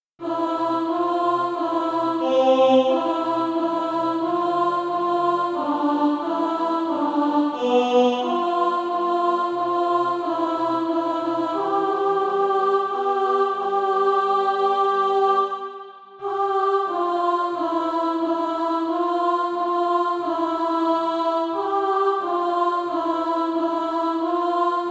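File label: alto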